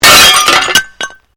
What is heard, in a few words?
Glass pane smash; Material components for constructing more complex sounds